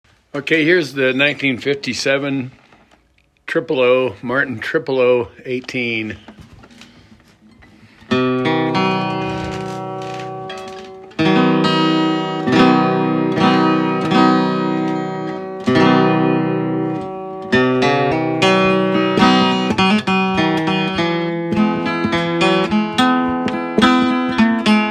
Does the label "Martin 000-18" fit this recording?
Yes